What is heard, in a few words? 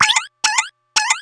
Cartoonish Sound; PC; MARIOTEACHESTYPING2